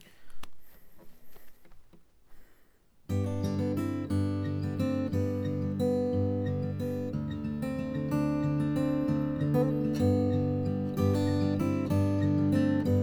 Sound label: Ac Gt Arp Most Neck